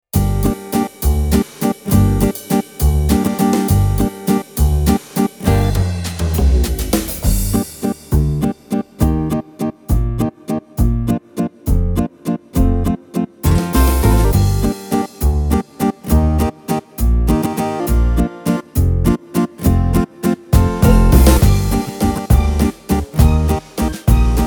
Guitar Waltz

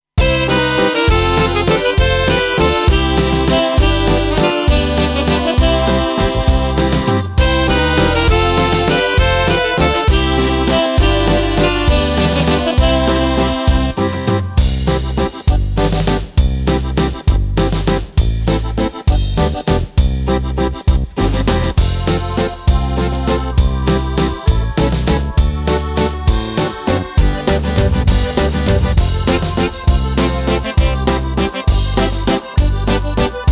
Folk Waltz

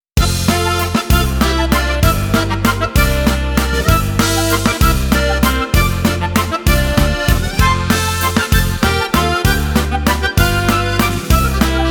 Folk Waltz

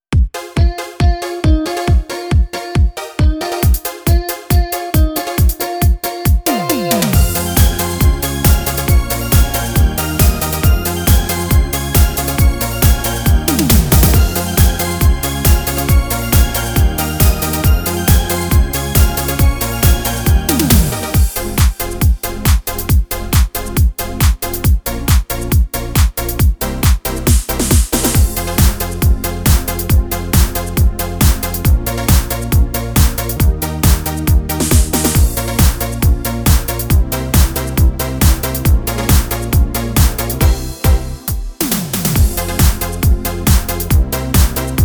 Disco

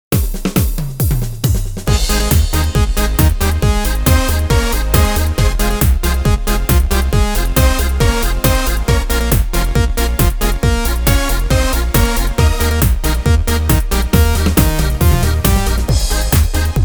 Drossel Dance

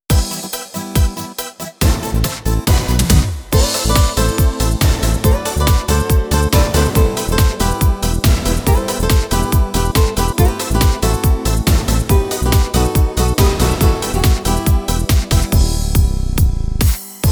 Beat

